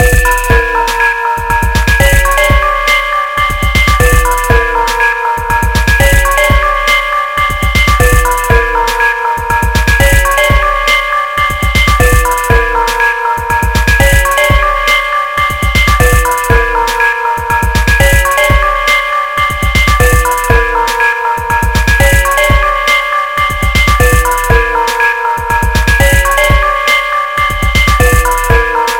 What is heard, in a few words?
Windy Bulb Beat